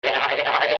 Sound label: head shake